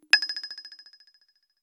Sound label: droplet notification